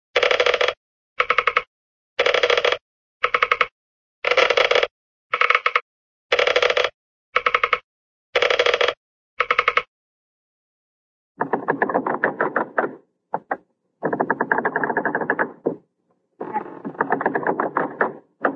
creak, chair rocking chair creaks; cartoon

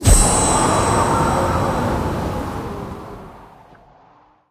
taunt yeti appear snow; video games; source engine; tf

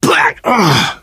reaction disgusted, biker, voice; source engine; left 4 dead 2

